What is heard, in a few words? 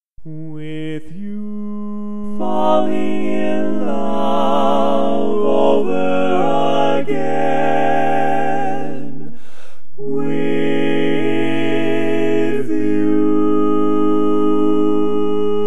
All Parts, barbershop tag